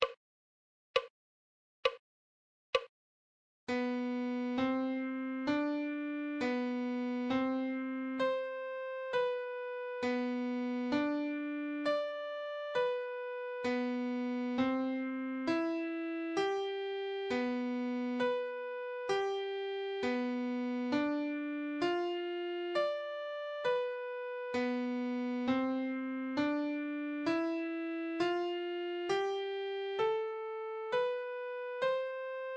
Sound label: musical reading in treble clef Adagio